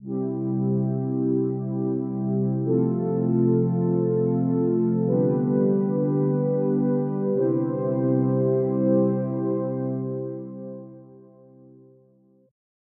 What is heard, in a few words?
no efx soft pad; PADsynth